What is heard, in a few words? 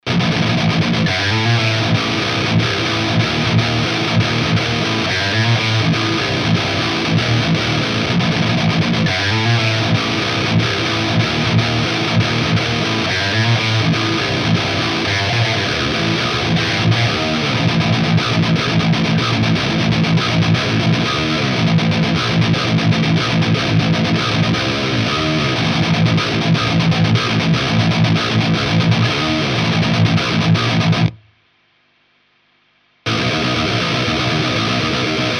MESA MESA GIT, Marshall MF400 Mesa oversized cabinet comparison